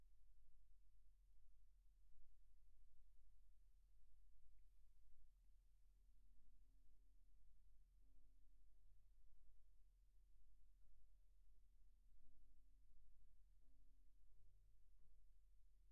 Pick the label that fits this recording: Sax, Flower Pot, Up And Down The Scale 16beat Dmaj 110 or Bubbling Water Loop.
Sax